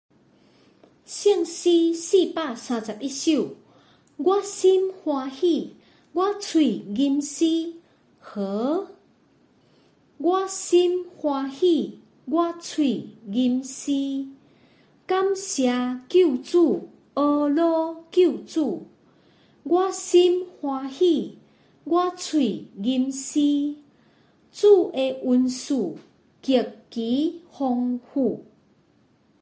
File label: Chorus, children; praising the lord with gladness